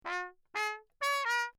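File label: trumpet mute phrase; Lechner; JoRal; Perinétventiltrompete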